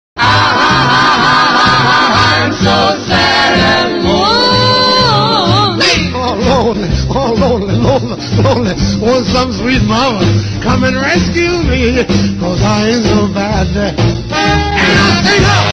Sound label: singing; yoda